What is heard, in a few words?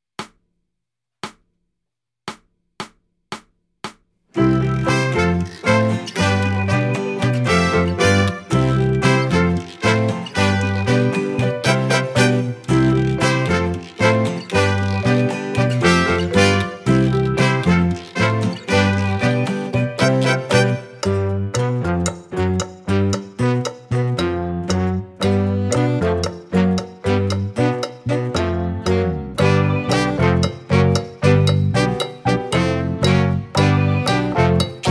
Caribbean Sunset Backing